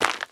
Cave Footstep; The Inn; Devour 2021 gamerip pc macos

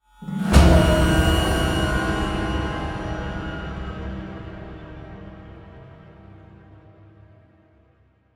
Stinger Drama; Dishonored

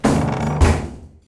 door fall

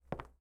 Walk; portal quest android; Mother Nature

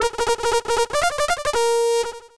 Bugle Call; Lethal Enforcers II: Gun Fighters; Genesis

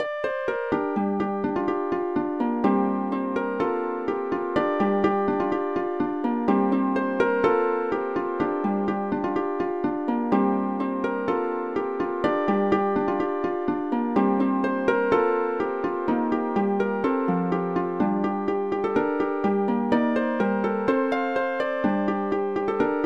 harp; Infinitode 2; 40000 bytes of harp

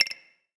Ui Button; Portal Quest; android; SFX Ui Heroes Button

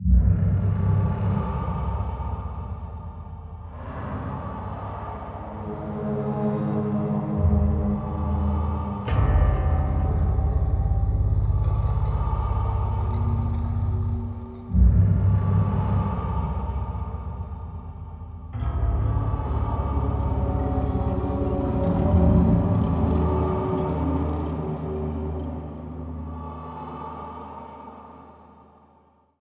Amb; Amnesia: The Dark Descent

